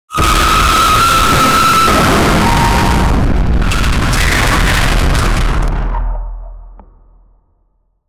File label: intense demonic horror